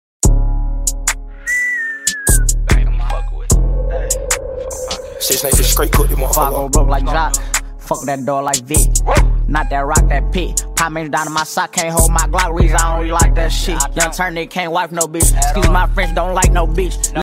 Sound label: lovely hip hop music ringtone